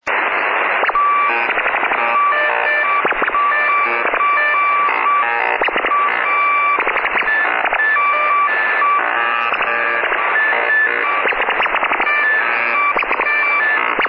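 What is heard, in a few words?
Unidentified digimode 12100 kHz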